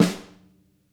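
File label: snare medium, Drum Kits; Sonic Reality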